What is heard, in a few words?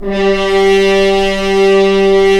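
french horns, swells; Roland LCDP06 Brass Sections; F.Horns FX+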